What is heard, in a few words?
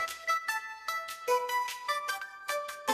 notification